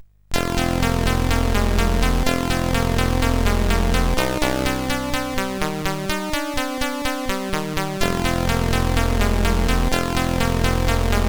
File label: Metal Warrior 2, CSG8580R5